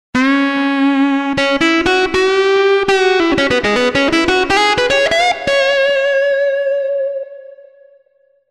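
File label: Pop Guitar; TURBO VL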